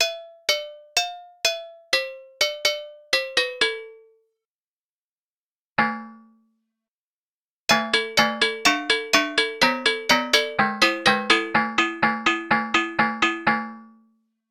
agogo

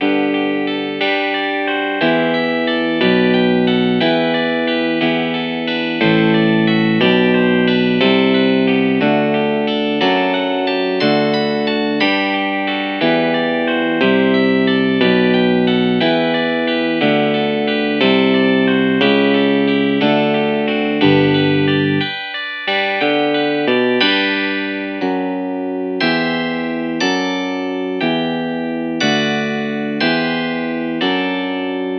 clean guitar

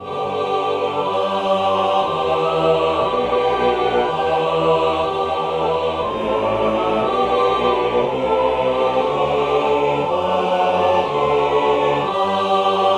ahh choir

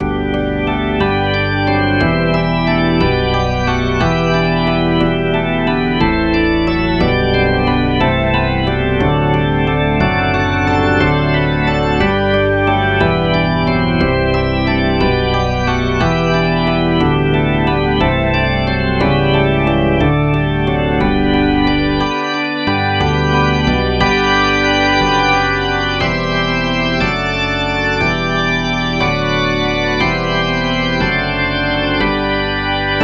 rock organ

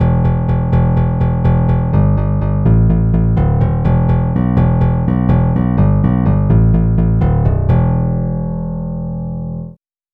picked bass

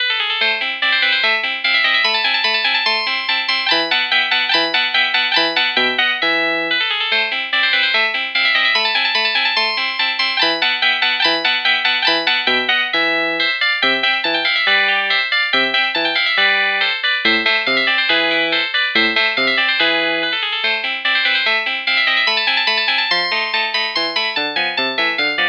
clean guitar

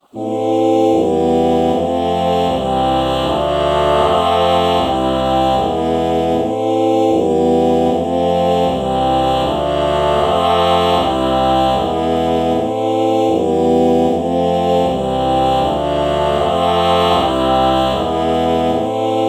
ah oh chord morph

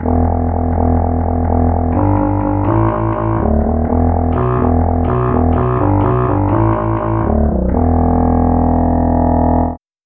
tenor sax bass

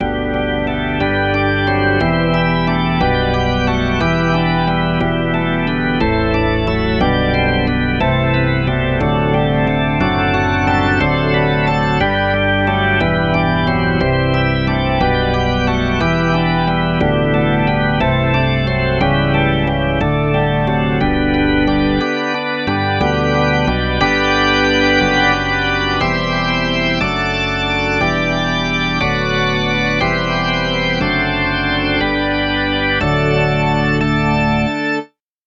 rock organ

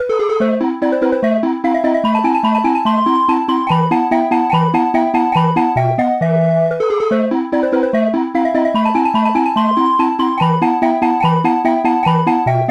ocarina mozart

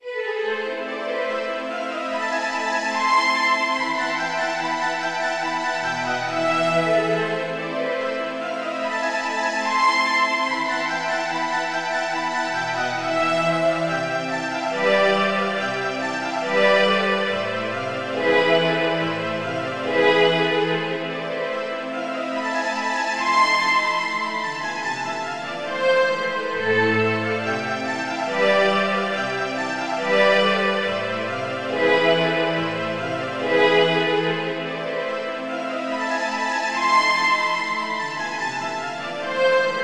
slow strings

